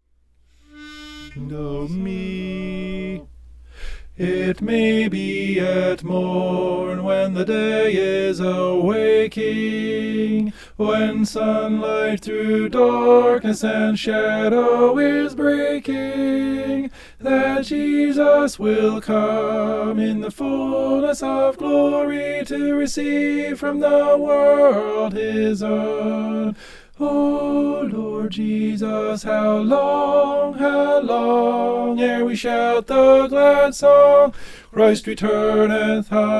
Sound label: tenor; it may be at morn